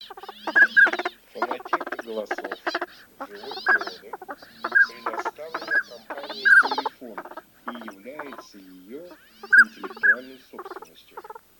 Шилохвость Codone; plurifon

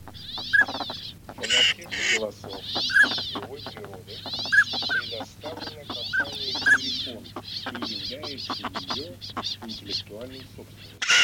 Шилохвость Codone; plurifon

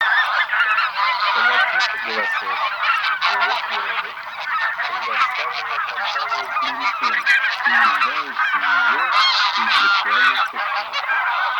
Гусь базар

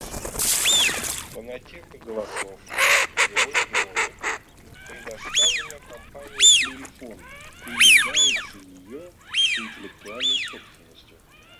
Свиязь Fischione

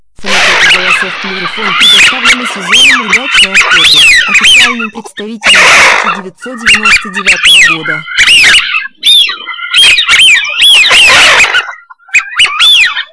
Свиязь

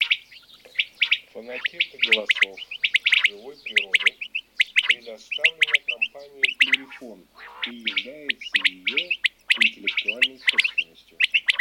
Перепел Quaglia; plurifon

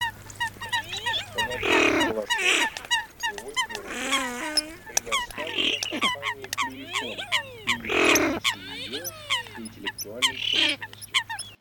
Лысуха Folaga Красноголовый нырок Moriglione; plurifon

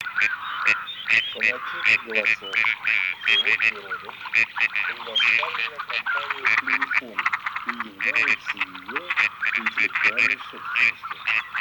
Серая утка Canapiglia; plurifon

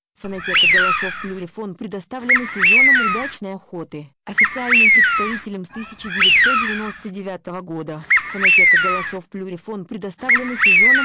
свиязь; plurifon

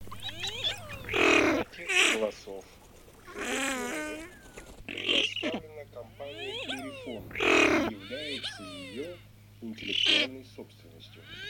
Нырок Красноголовый Moriglione; plurifon

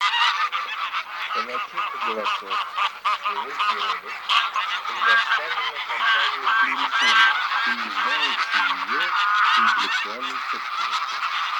Гусь серый Oca Selvatica

